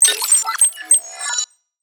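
UI, HUD, Terminal, Beep, Lofi, Scifi, Menu, Action, Click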